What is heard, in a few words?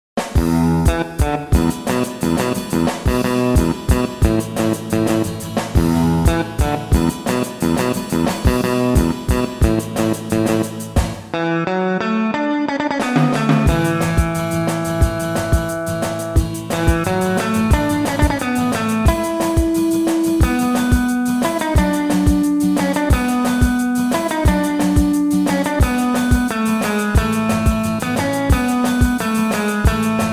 no Bass